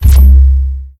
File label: Object Creation; moul